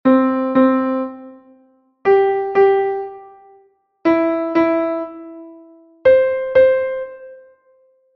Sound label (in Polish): pryma czysta przykład